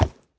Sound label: wood, horse; minecraft